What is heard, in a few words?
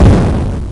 explode